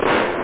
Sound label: clap; OctaMED SoundStudio V1; drums claps